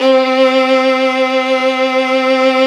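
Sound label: VIOLIN VIBRATO; Sound & Vision; Gigapack I CD 2; Violins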